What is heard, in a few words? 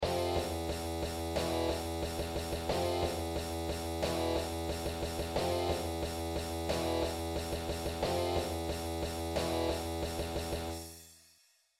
Modern Rock Band